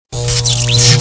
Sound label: zap